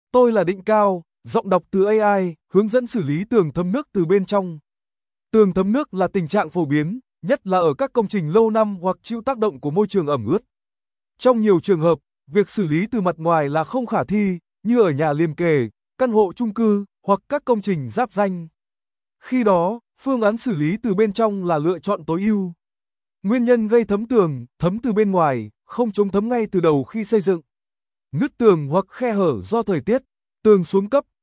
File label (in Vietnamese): chong tham trong